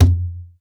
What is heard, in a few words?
CONG BAS; Xsample VOL-9